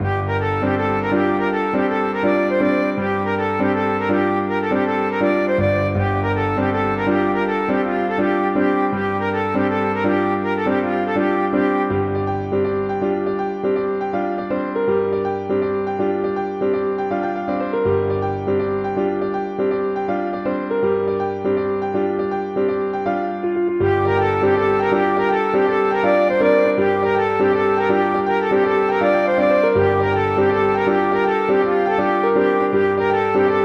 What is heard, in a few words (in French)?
Gavotte Montagne